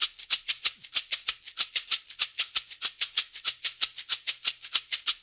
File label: LOOP, SHAKERS, Percussion